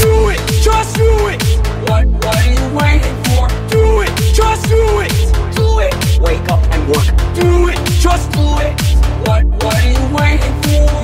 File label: Autotune